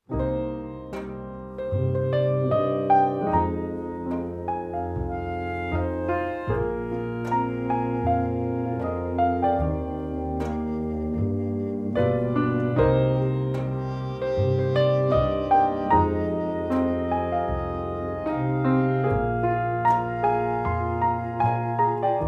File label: Gold Coast Rhythmn